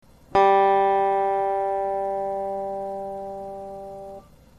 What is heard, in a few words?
Tuning G, G string (the third string); guitar